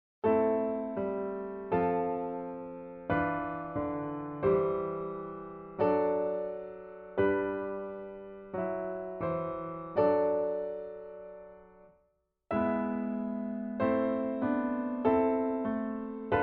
Chorale No.99 (piano); Bach

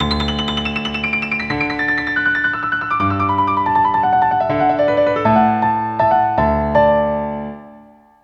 Articulation Exercise - with a Twang

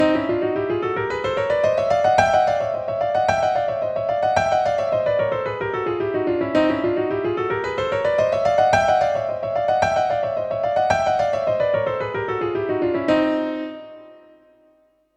Exercise in Chromatic Double-thirds

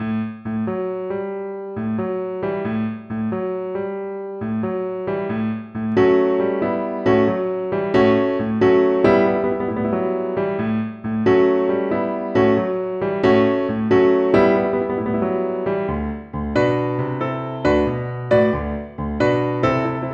Standard 12-bar Blues (in A Major); transcribed by Elmo Peeler

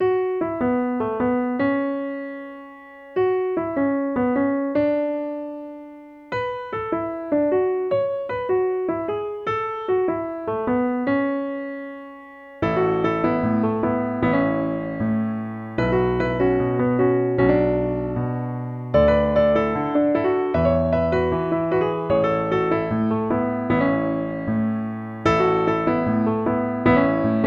Exercise in Twangs

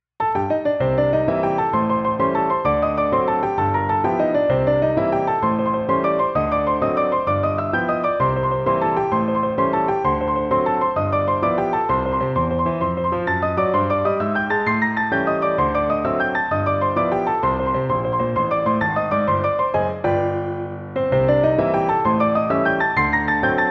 Exercise in Right-hand Octaves; Technical Exercises for Improving Keyboard Technique

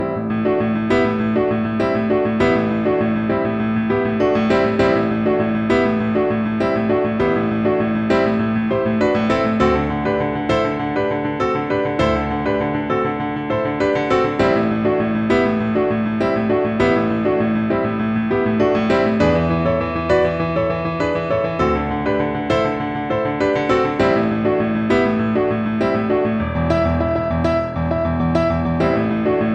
Rhythmic Exercise - Splitting the Left Hand

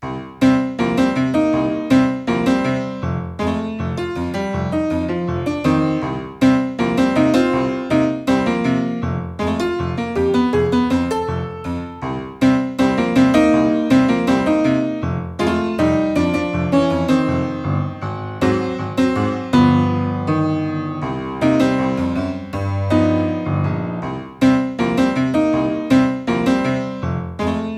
Exercise in 'Swamp Rock' (tack); 4ths, 6ths & Tritones (honky-tonk piano)

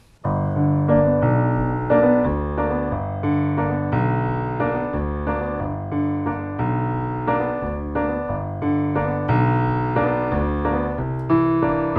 Early Professor Longhair Piano Style

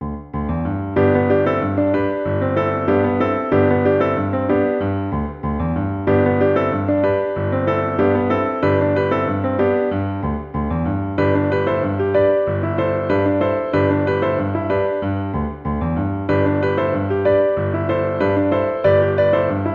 Exercise in Chord Inversions

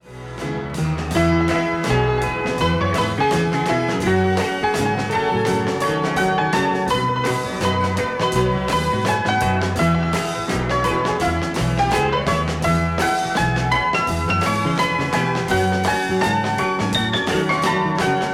Piano Solo

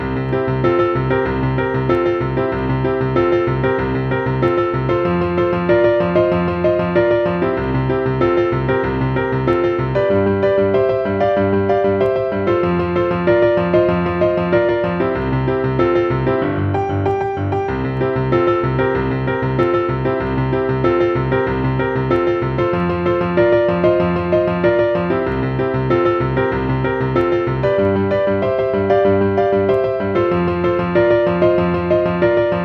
Paradiddle Exercise

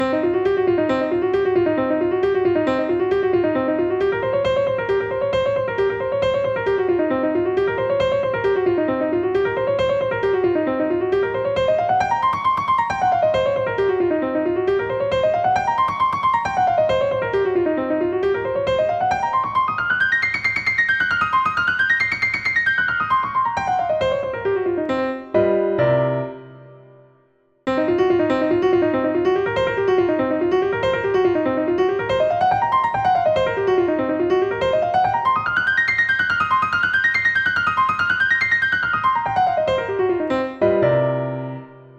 Alternating Hands Exercise Blues Scale

